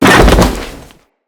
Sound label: Fall Metal, Foley; Characters; Tenno